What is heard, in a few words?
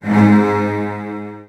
G#1, Virtuoso Strings, 16TH; ILIO; Kirk Hunter; CL 16TH